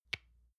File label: lotion large lid close soft; berufe; friseur